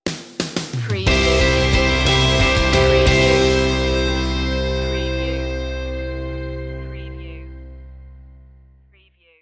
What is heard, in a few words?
over and over stinger